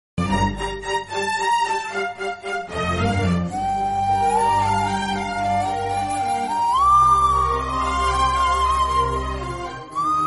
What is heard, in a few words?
bgm; 7g rainbow colony